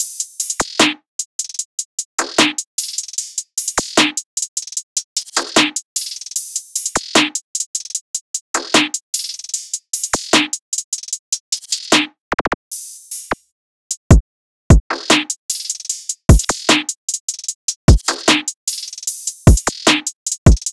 Jungle Drum Loop 151 BPM; Cymatics

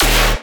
Bass Hit D#, Dubstep

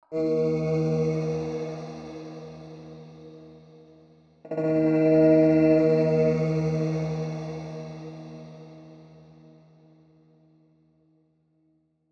110bpm E Grain, Vocalpack; Vocals

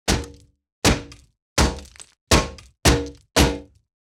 Golf Club Hit Impact Glass; Fairlight Sound Lib

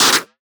Retro FX; Video Game; Cymatics; Video Game Sample Pack Vol 1

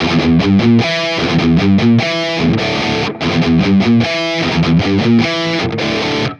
Metal Guitar Loop, 150 BPM E Maj; Cymatics; Vibrations Guitar Sample Pack

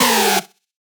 synth screech oneshot E dry; Synth Hits; GhostHack

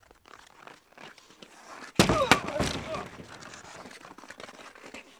Hockey Skate And Board Hit; Sports & Recreation

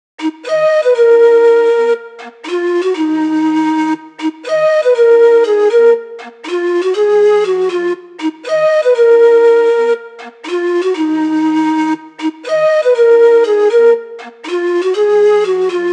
Melody D# 120 - Windpipe; HipHop; Hip Hop and Trap; Ghosthack

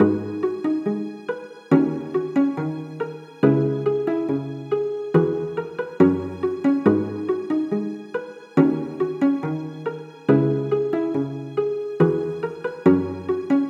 String Loop 140 BPM G# Min; String Loops; Future Bass; Instruments; Moonlight; Cymatics; Future Bass Starter Pack

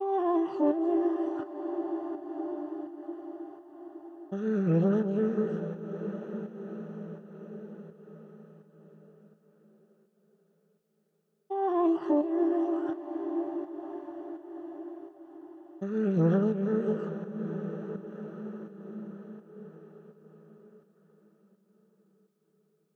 Vocal Loop 167 BPM A Min; City Nights

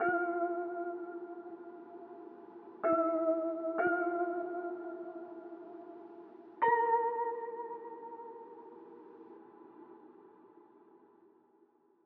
127 BPM D Min Bells; Cymatics; Cult

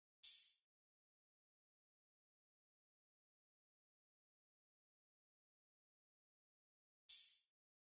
Drum Loop 140 BPM Open Hihat; Cymatics; Mystery Pack Vol 4; Sturdy Drum Loop

